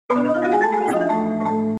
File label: Marimba Run